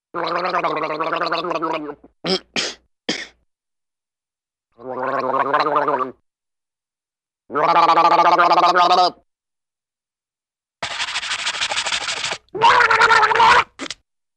Funny Man Teeth Brushing & Gargles, Cartoon; Hollywood Edge; Cartoon Trax

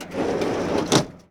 Plane P40 Canopy Open; Airplanes

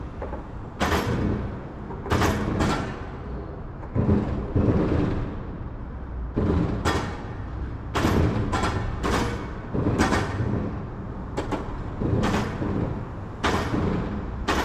Urban traffic moderate elevated metal plate; urban and suburban; boom library

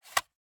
Viewmaster, plastic, lever, load; Eiravaein Works; Vaeyan IV

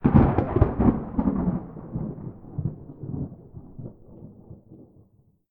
thunder low; Apotheorasis

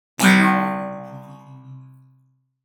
Impact Comic Spring Hit Metal, Flat; Hollywood Edge; sound designer's toolkit v2

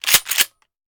Shotgun Foley; Sound Ideas; Guns

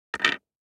DICE on Hard Wood, Drop, Standard, 6 Six Dice; Samples; ArticulatedSounds; DICE Sfx Party (Extended)

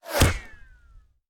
Bullet Impact; Sound Ideas; Guns

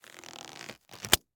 Latex Glove; Blastwave

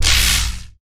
Scifi Forcefield Activate; Energy & Forcefields

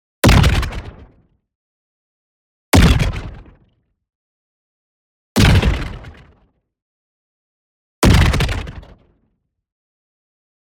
EXPLOSION SMALL Energy Stun Grenade; boom library; Matter

